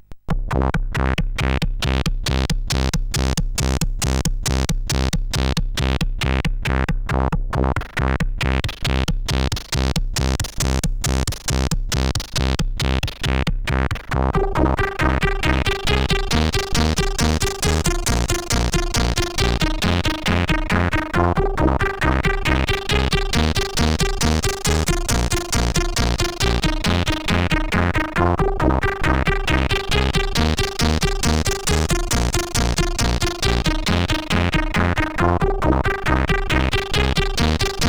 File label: Loop, sid CSG8580R5; Unstable 92 Seconds